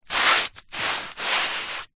footstep scrapes scuffs sandal concrete light dirt; foley; zapsplat